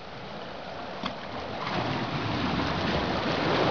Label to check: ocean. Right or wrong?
Right